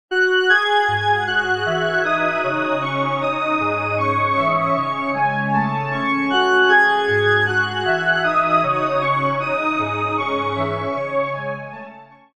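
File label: revolving multiplier organ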